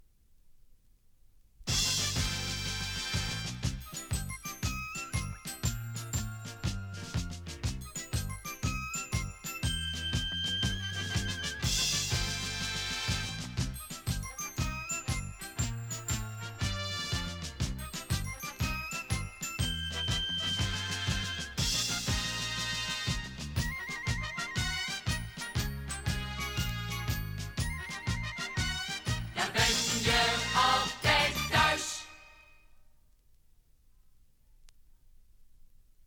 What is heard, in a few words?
topformat maxi jingle single